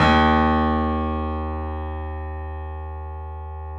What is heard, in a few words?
Small Pianos, 3-D Audio; E-MU Producer Series Vol. 5; 3D Multis